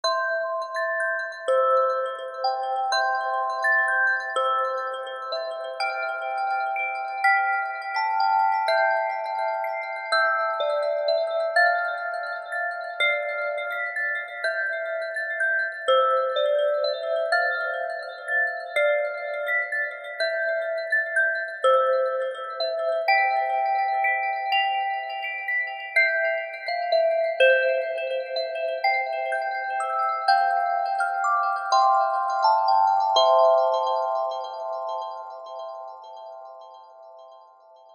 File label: samsung dream bell